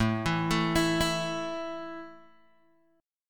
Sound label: A 5th A down Guitar Open G